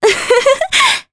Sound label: Vox Laugh jp; Veronica; kingsraid